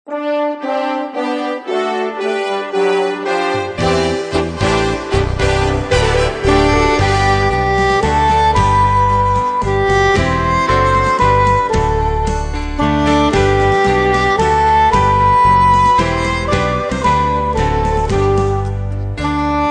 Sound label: Instrumental Hymns